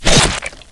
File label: knife slash, zombie claw